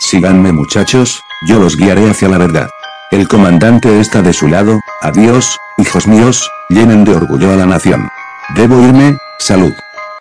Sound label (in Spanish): misterio